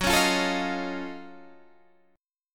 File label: F sharp Minor Major 13th, Guitar, Open D